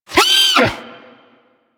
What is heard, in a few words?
Snow Critter Vocal Pain